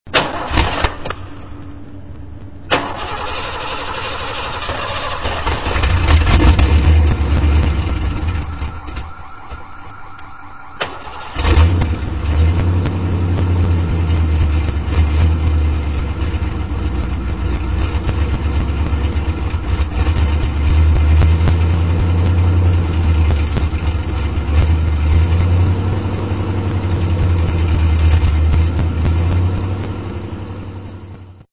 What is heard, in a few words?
car